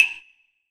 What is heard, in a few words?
CLAVE WET, SR-16 DRUMS; EdgeSounds